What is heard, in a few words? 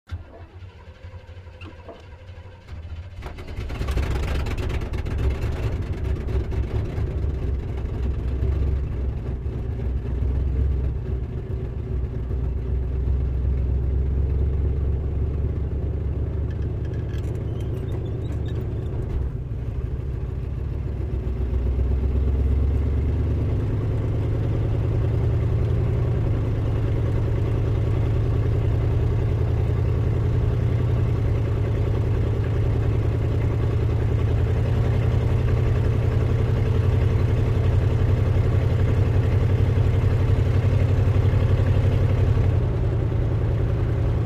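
car driving; Звуки движения в автомобиле по трассе, шум во время езды с разной скоростью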